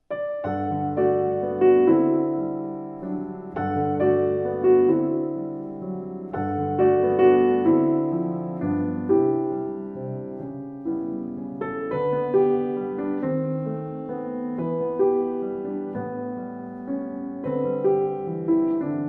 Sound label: Solo Piano